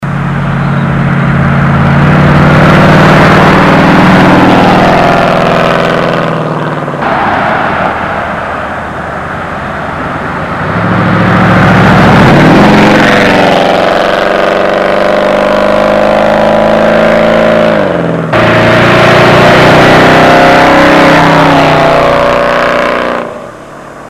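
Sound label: Charger Drive By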